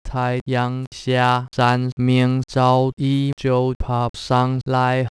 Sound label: male, vibrato